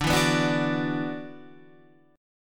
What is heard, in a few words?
Gb 7th Suspended 4th Sharp 5th D